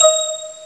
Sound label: bell string; ST-XX Amiga Soundtracker Sample Packs